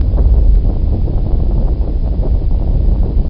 rumble

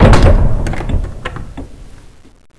door stop

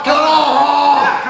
melee; saitama

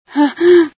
startle, female

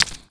li walk

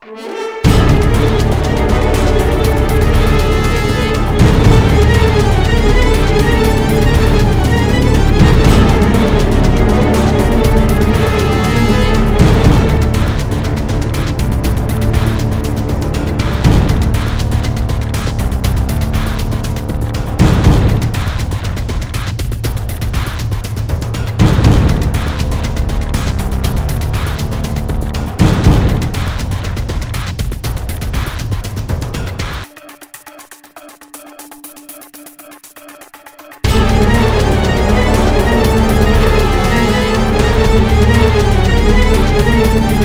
action, music; russian sounds

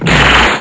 buzz, hornet

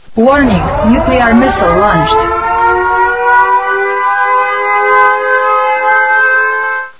nuke

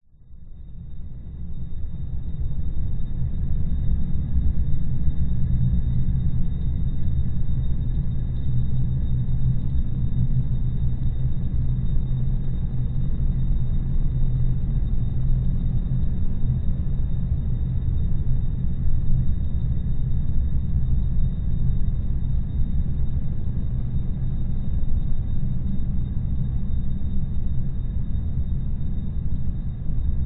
amb special; Resurgence

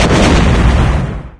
explosion large

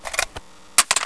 oicw clip out, weapons

